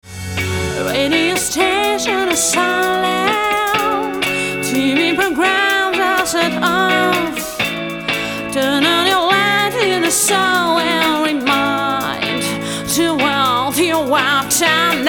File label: viol